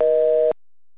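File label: PHONE BUSY; Duke Nukem 3D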